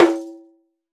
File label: Djembe Rim; Roland BK-5; Percussion; Afro